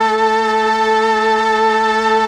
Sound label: str a3, String, MELLOTRON; Retro Sampler; Brass + String